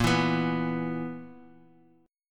B Major 7th Suspended 4th Bb

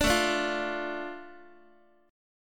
A Suspended 4th Sharp 5th A down Guitar, chord; Asus4#5 strummed; JGuitar

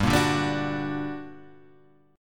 G-Minor Major 7th, GmM7 chord; strummed; JGuitar

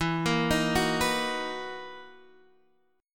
B Major 7th E; BM7/E Chord {X 7 8 8 7 7} arpeggiated

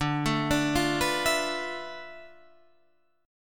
D Minor 6th Add 9th D

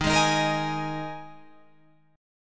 Eb Suspended 4th, Guitar, Open F; Ebsus4; strummed

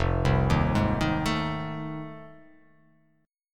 F 7th Sharp 9th

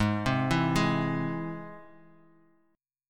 Ab Augmented Ab Bass Standard; Ab+ arpeggiated; JGuitar

